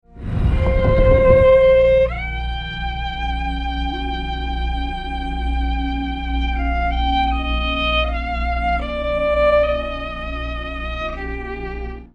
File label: The Violin; zork nemesis